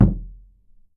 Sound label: KOO KU M, KOREAN DRUMS; AKAI S6000 CD-ROM; WorldSounds